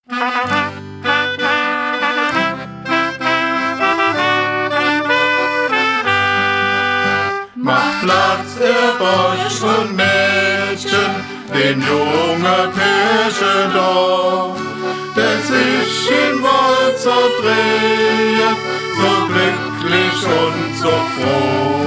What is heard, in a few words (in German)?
Mundart Hochzeitslied